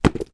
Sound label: shoes work boots x2 drop down on ground; foley; zapsplat